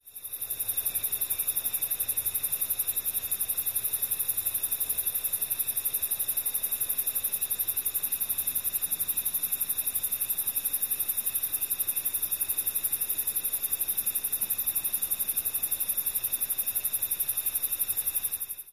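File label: Crickets Background Steady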